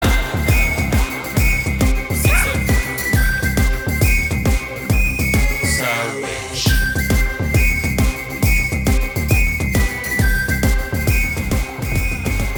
Instrumental Ringtone

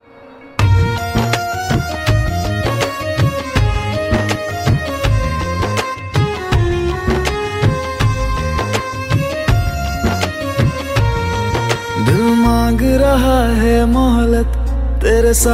instrumental ringtone

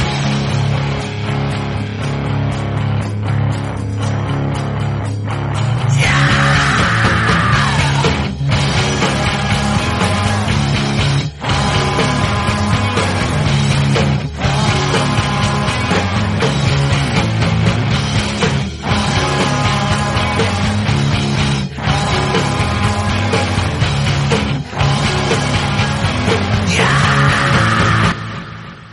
loud guitar scream ringtone

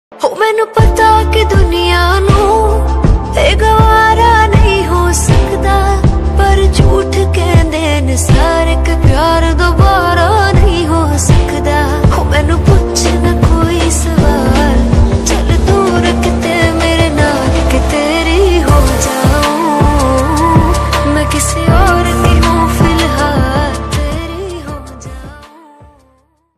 female version ringtone

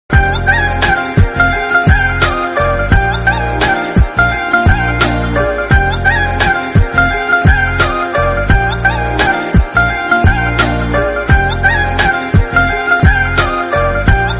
instrumental ringtone

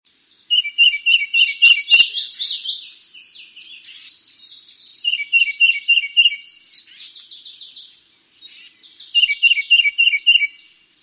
tufted titmouse call; iphone ringtone

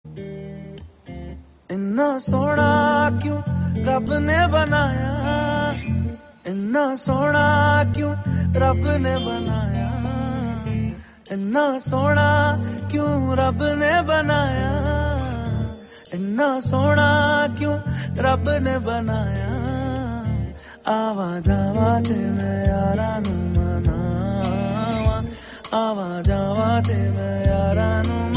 hindi song ringtone

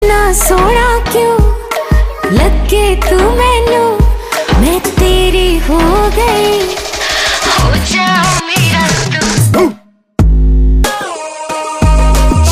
female ringtone; saaho

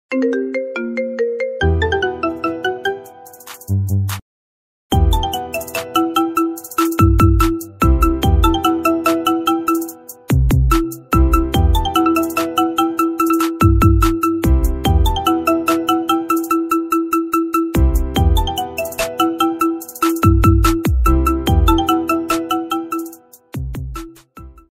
marimba remix marimba ringtone

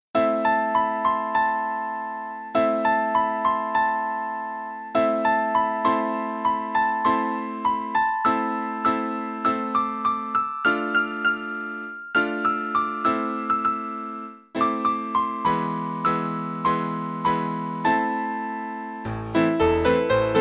piano ringtone

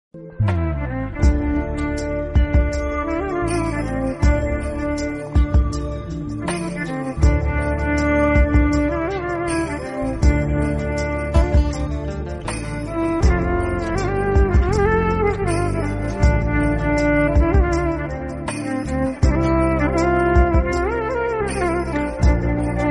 Violin Ringtone

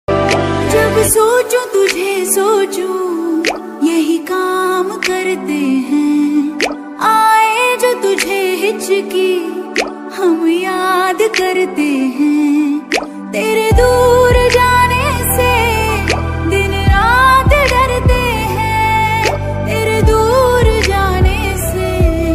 love ringtone

